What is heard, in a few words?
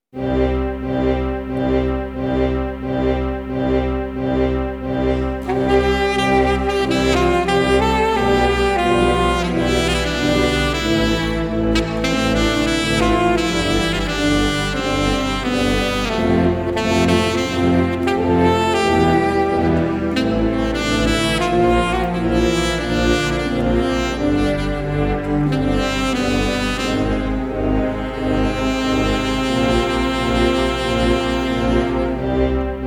Sasophone